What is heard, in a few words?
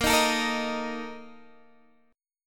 Bb Major 7th Suspended 4th, Guitar, Open D; x,13,13,15,13,13